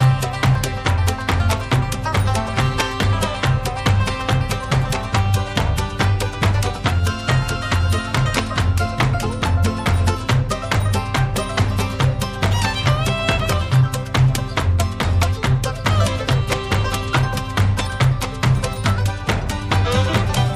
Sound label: Music Free Spins Loop; Mahi Gaming; oink Country Love